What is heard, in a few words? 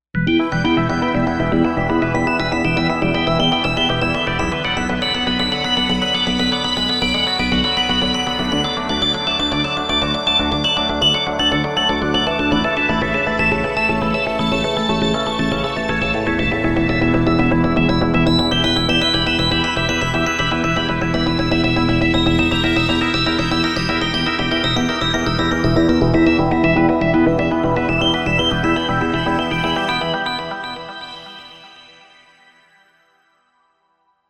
RETRO SEQ, synth